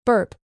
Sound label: burp